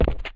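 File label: gritty cement land, footsteps; chief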